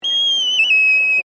Bird Cockatoo Sulpha Crested Talking Loud, Australian Cockatoos; Birds; Animals